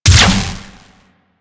Rifle Proj Explosion; Warframe; Weapons; Wisp Rifle

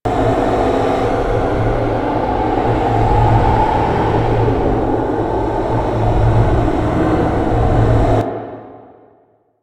Reveal Build Up Loop; Entrati Labs

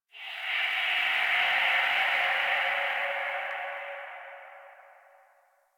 Space One Off F, Ambience; Warframe; Tenno

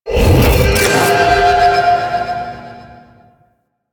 Sentient Aerolyst Wing Ability Charge; Warframe; Sentients

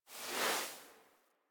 Foley Idle Stand; Enemies; Kubrow; Warframe

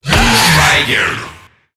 Infested Lich Pain, Barks; Warframe

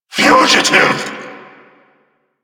attack vocal; Warframe; Duviri; Dax; Vocal Set B

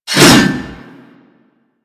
Axe Swing; Warframe; Weapons

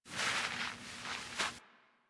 foley idle roll; Enemies; Warframe; Catbrow

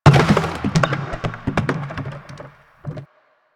large plastic garbage bin

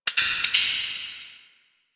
Sparks; Ambience; Warframe; The New War; Quests; Zariman

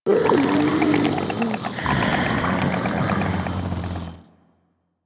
Zombie Breathe; Warframe; Infested; Breathing

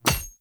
Grunt Death Default; Sinecure; Melee Grunt; Enemy; Death Effects

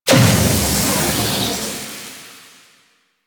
Vaults Keyglyph Vampiric Hunger; Warframe; Entrati

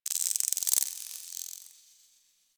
Cephalon Tech Very Small, Ambience

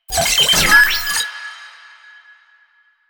Sniper Drone Vocal

